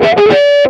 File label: success; jam; stereo